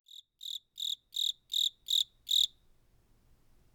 Cricket Chirp; UltimateFishing

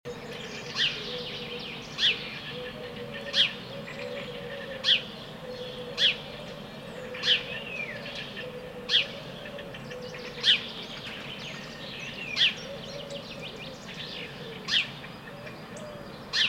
early morning town park ambience loop; UltimateFishing2020

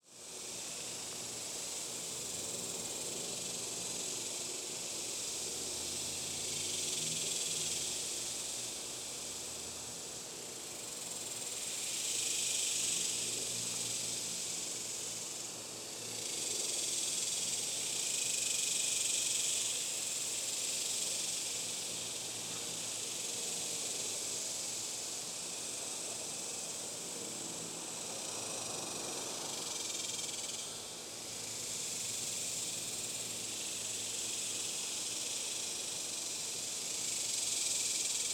Cricket Town; UltimateFishing2020

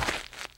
STEPS Gravel, Walk

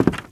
STEPS Wood, Creaky, Walk

